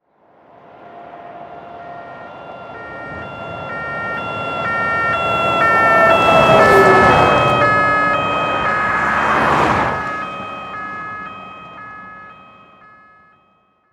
POMPIERS

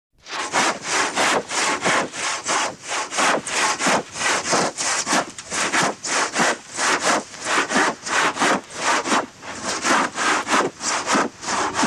LA SCIE

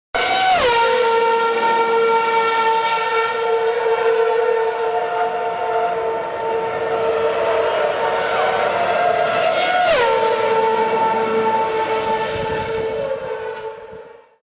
BRUIT COURSE VOITURE

